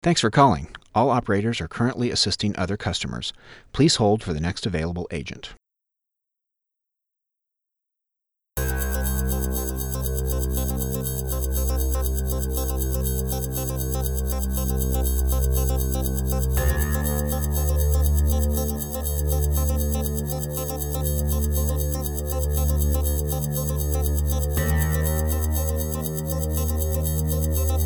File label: electronic